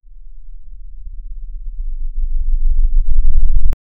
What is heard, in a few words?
fast step forward in slow; motion